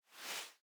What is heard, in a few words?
fast whoosh